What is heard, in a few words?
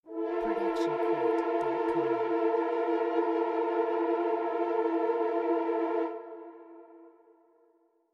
horn trill